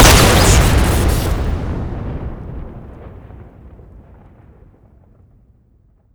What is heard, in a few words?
explo thrower shoot crit, weapons; tf2classic